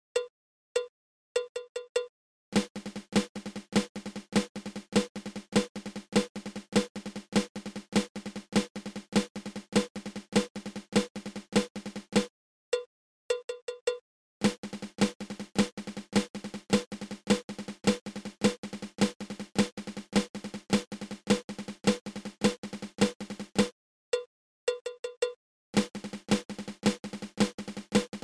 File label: Flam Drag